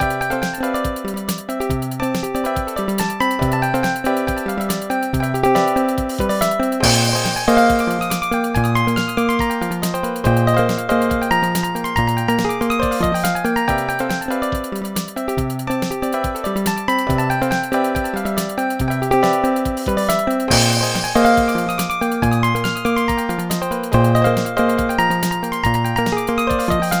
solo; Dr. T's MIDI Recording Studio

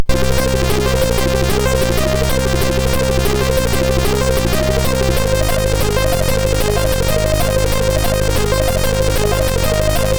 sid MOS6581R3; Tuned In

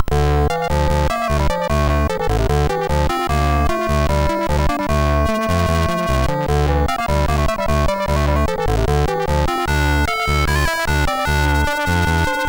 Soft, MOS6581R2

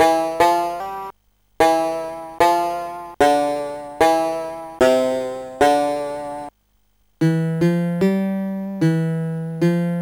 dueling; Musicraft

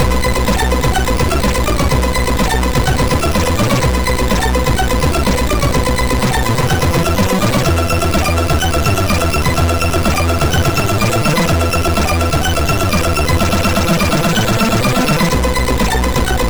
Light Intro sid MOS6581R3

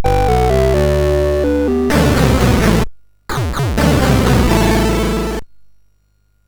sid MOS6581R4; Tiger Mission

